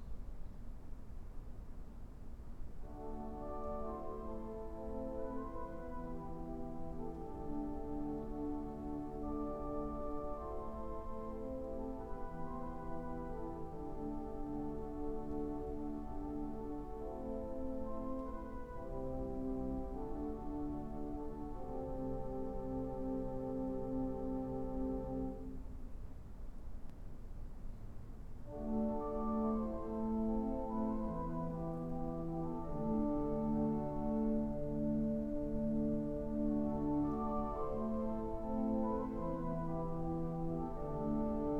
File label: nitsua and spitzflote, organs